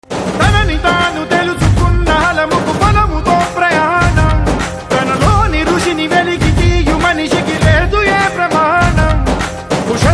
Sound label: Theme Ringtone; Maharshi